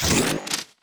arc reload; weapons; dota; drow ranger

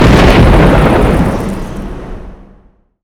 storm cast; weapons; dota; razor

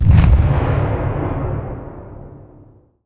dark rift aftershock; underlord

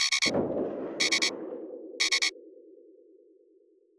take aim, sniper; weapons

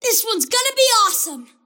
cast pain; kidvoker; dota; invoker

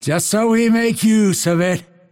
lasthit pain; dota; phantom assassin

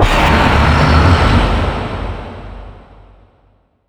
uproar cast; weapons; dota; primal beast

